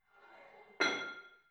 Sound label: barbell plate slide in hit ring reverby soft; sport freizeit; fitnessstudio